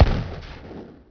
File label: AA Gun Fire; shadez